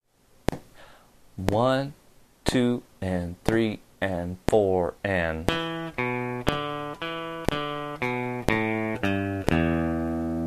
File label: slow speed, blues guitar